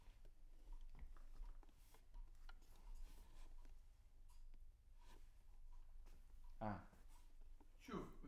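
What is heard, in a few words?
guitar Mic; GTR 1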